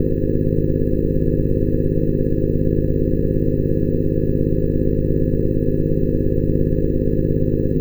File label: Drone Ambient; Drums & Drones